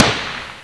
shooting near; Bolo

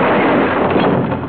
blowup; Lunar Lander

